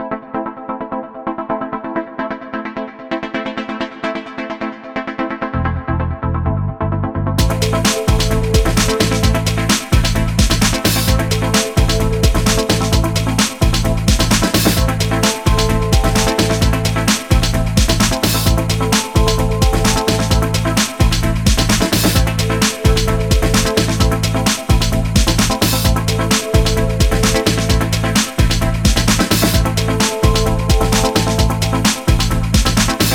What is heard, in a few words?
130bpm Intro outro loop